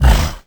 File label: golem stone hit; Crypt of the NecroDancer